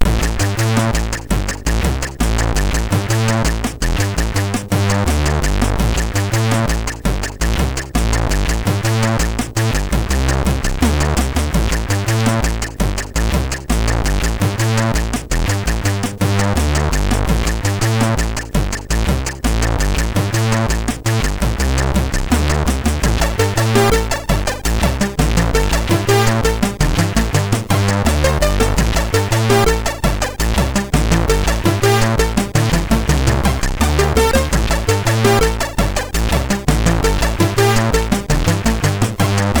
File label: Loader, MOS6581R3; Loader Zak; hvsc